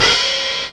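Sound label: open hi-hat; Mirage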